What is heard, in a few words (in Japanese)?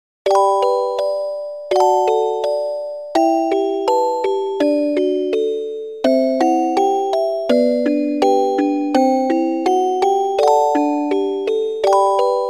オルゴール系音色の単音曲。回想シーン向き。; こんな感じの短い曲; 1分58秒; 作業用BGM